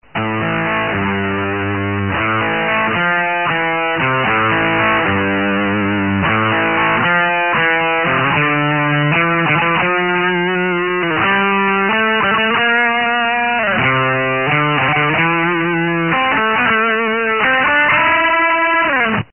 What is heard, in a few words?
dirt